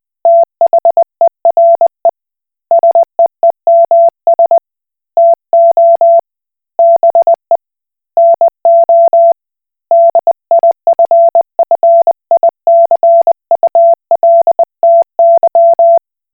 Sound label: Koch Morse